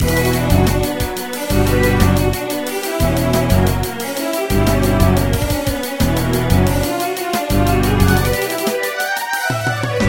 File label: groove